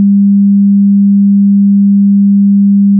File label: 200Hz Sine